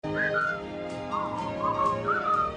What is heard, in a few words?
whistle